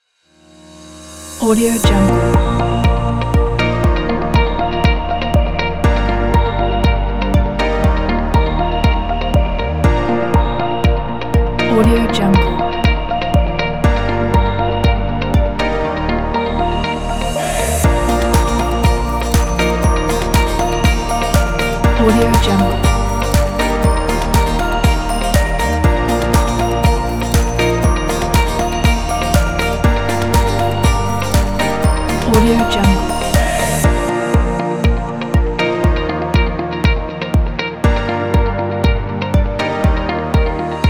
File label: atmospheric bright happy guitar corporate